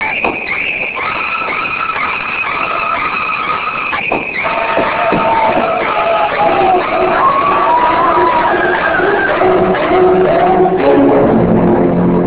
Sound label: music